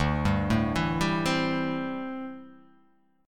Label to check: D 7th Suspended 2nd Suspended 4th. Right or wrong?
Right